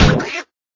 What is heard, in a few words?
Tap ducky; Clickergame